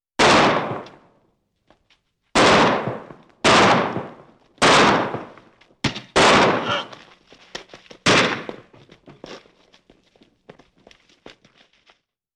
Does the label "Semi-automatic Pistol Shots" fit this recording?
Yes